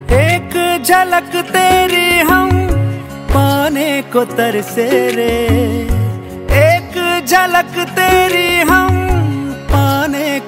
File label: Ringtone